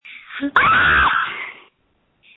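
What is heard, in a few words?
Scream, phone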